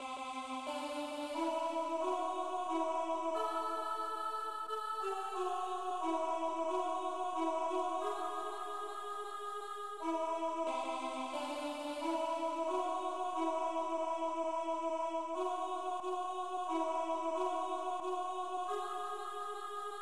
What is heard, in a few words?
alto